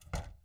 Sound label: cabinet shut; angband